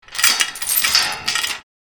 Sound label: Chain fence metallic rattling; gfxsounds